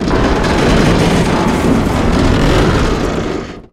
Creak Large Metal Tower Fall; SFX Kit; Creaks, Scrapes, & Misc

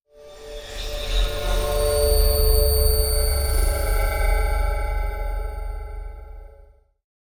Drone Feedback Monster Scary Logo; Hollywood Edge; sound designer's toolkit v2

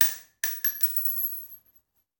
Bullet Shell; Sound Ideas; Guns

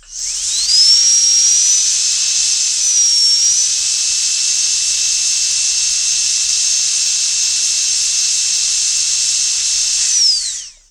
dentist, surroundings; best service Blue Box

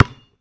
Street Ball Bounce Asphalt; SFX Kit; Street Basketball

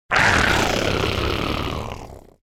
Growl Beast Die - Monster Growl; Hollywood Edge; sound designer's toolkit v2

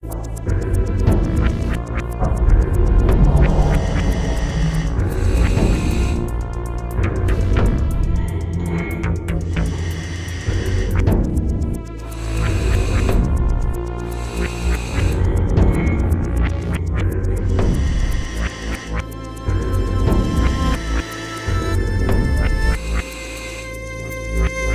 Final Theme

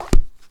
Book Close; SFX Kit; Foley - Misc

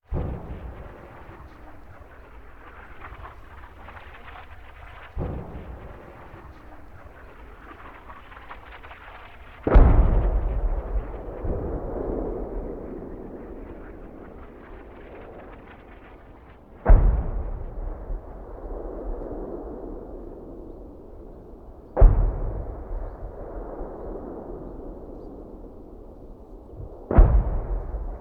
Artillery Cannon Artillery; Sound Ideas; Guns

